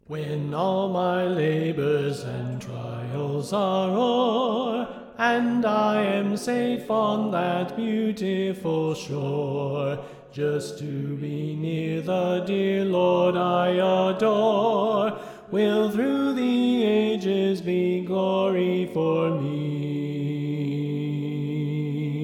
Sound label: Soprano Left